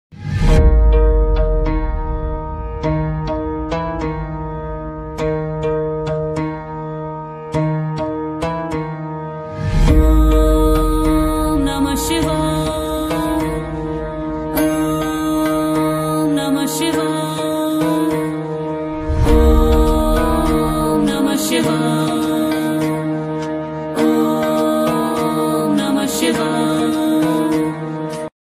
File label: mahakal ringtone mahadev ringtone